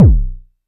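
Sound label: vermona drm1 mk3 bass drum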